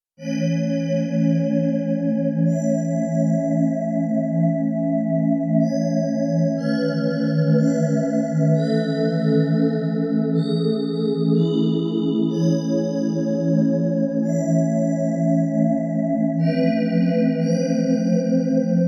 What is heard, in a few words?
Slow Glass Pad, Korg Radias-R